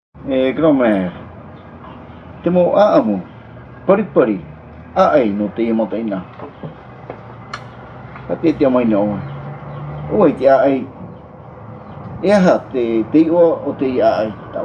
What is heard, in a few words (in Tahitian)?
Te tahi pehepehe nō Taravao. / Un chant de Taravao.; Te tahi parau nō 'Ōfa'iara'a. / Des propos concernant 'Ōfa'iara'a.